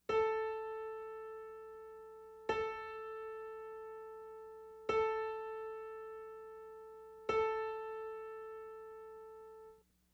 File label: Middle C, piano; alfreds basic piano library adult courses